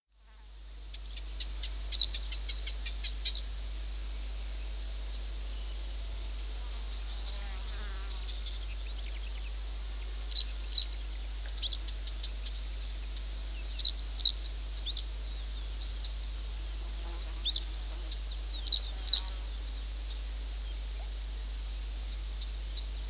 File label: Yellow Wagtail